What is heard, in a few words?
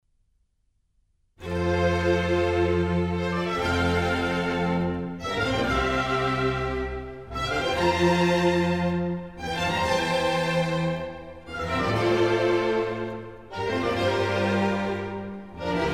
Water Music Suite